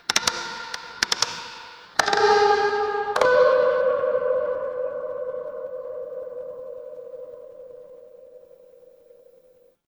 horror creak

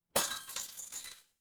glass shatter

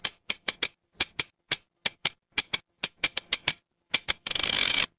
mechanical slot machine

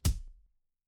impact punch

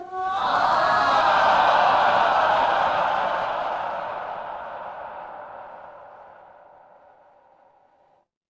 crowd cheer

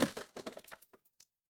impact crash

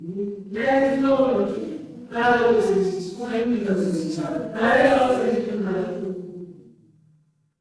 crowd murmur

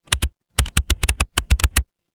office keyboard

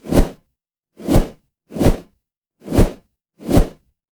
fire electric whoosh flame

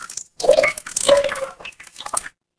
water pour

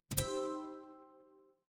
feedback achievement

